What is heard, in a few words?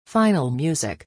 final music